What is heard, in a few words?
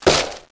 ammo hits wooden box; PanzerE; Desert; CD-Gamer 1999-10